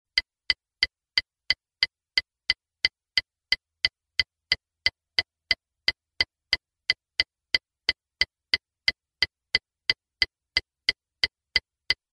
Звук работы включенных поворотников в машине

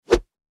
Глухой переход

